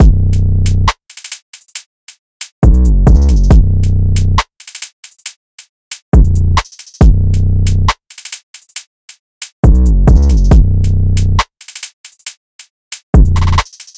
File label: 137 BPM Bm; Deuces